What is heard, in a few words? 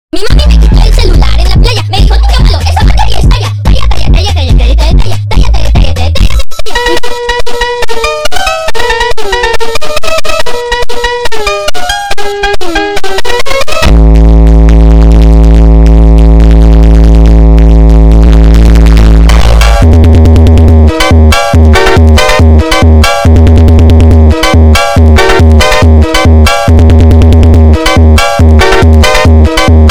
funk Superbass